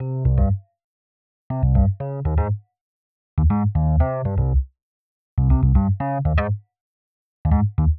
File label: Rhodes Bass C; PowerFX - Nu Jazz House